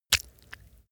leather impact mud medium

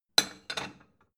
plate small shelve soft

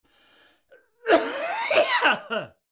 coughing

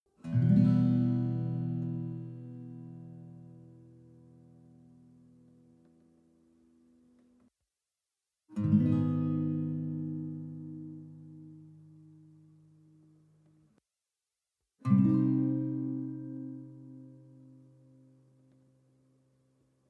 western guitar chord full minor soft

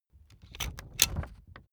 puncher operate heavy

